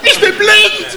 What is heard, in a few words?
blind; cstrike